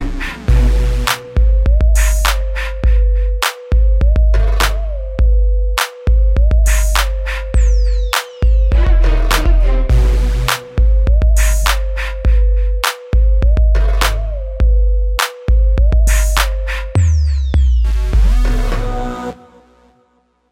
Eygpt Tone

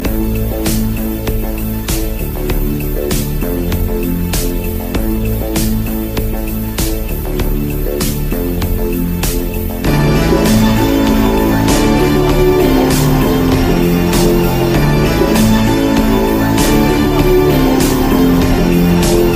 Beat Major Stomp

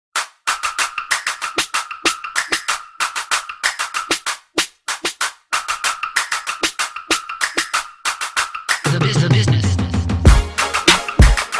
instrumental

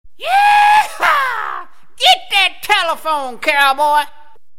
Yeehaw

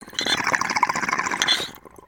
Bubbles

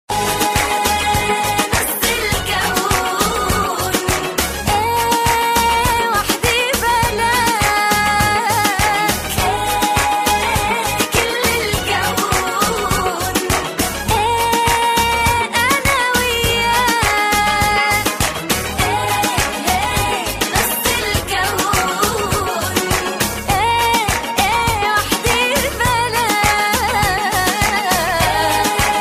Arabic Music

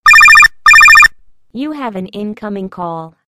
Incoming Call

